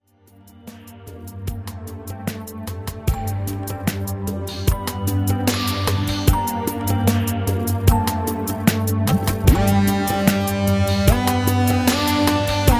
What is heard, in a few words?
moog superlead